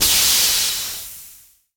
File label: break; dog day grey; GREYDOGDAY POPP3; jumpmod